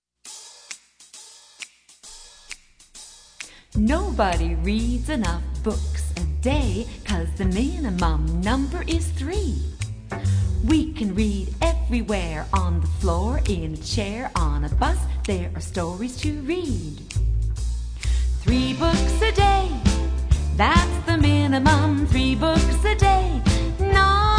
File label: vocal sample